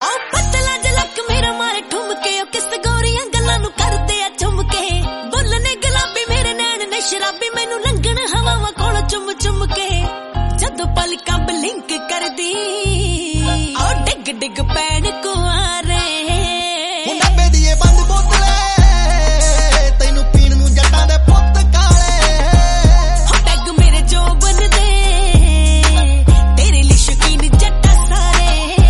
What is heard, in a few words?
Band Bottle